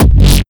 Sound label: Kick Dist Bass FX 1; Granular Sumo